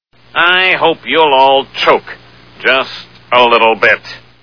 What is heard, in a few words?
choke